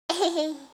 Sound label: ehehe; kanjou